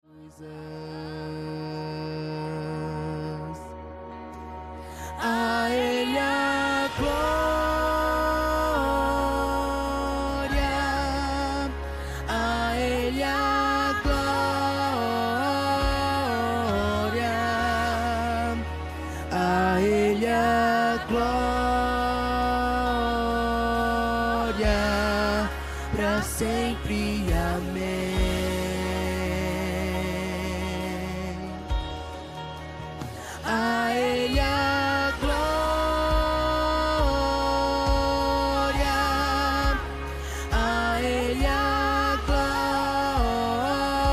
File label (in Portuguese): Tenor; A Ele a gloria